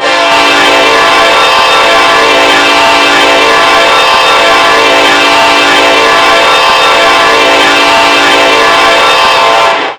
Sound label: k5lla, horn; run 8 v3 horn pack